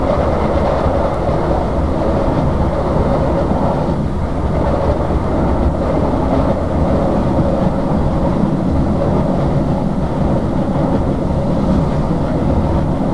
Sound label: run, Train cl158; OpenBVE